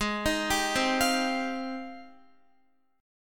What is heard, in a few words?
Ab Major 13th Ab, down, Guitar; AbM13 arpeggiated; JGuitar